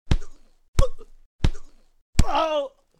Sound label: Toolbox; This Is the Police